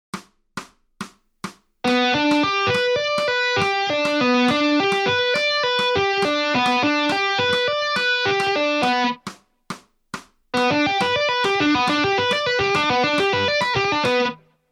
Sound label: Lick